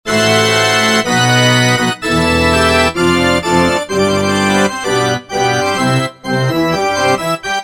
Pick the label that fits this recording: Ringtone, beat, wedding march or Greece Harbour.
wedding march